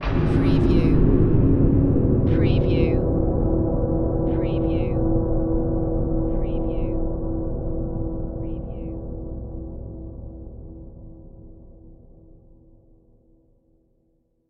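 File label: Production Element Hit Dark; Sound Effects Bible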